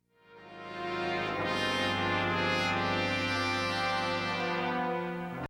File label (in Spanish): Bajo